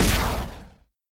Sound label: throwing sound; minetest; throwing arrows